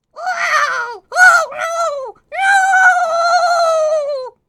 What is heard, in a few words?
Male Reaction